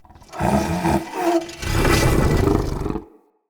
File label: Corrupted Battle Dog Idle Growl